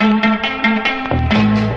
guitar, Loops, Phonky

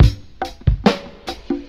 Drum loops, Boombap

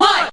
hai, ooh ahh

